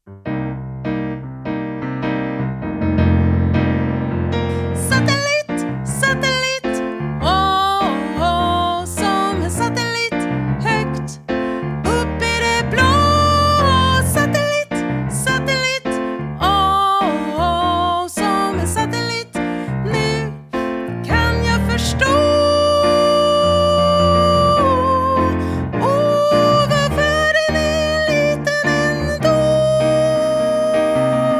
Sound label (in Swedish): sopran